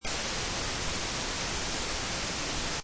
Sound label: Whitenoise, Loop - white noise, Static noise; Miscellaneous Electronic; Electronics; Michael Huang